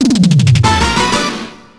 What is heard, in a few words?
Checkpoint; backfire; arcade